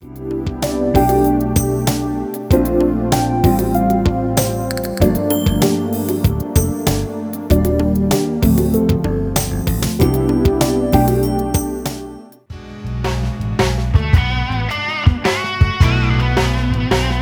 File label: Smooth Jazz